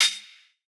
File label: Hihat, Dubstep; Ghosthack